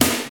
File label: shiny snare drum f key, Royality free snare drum sound tuned to the F note. Loudest frequency: 4459Hz; snare drum samples